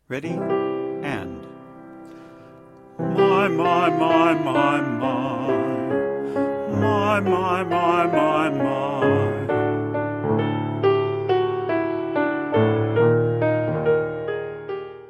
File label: Mai Mai Ascending